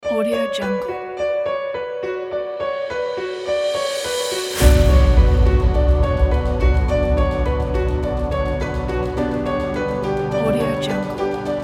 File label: piano epic